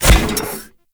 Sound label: Step Default; Warframe; Corpus; Ambulas; Footsteps